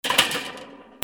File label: GARAGE